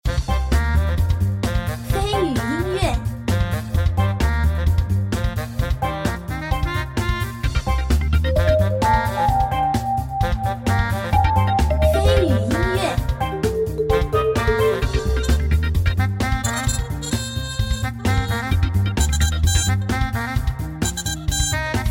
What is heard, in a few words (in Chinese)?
律动 轻快 休闲益智